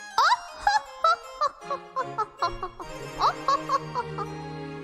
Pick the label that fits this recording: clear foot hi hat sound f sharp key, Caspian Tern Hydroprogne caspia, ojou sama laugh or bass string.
ojou sama laugh